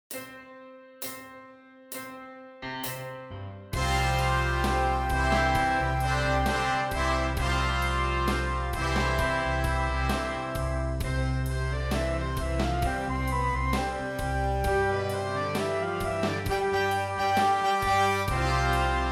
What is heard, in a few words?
Horns in Fifths